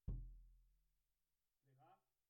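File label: Snare Bottom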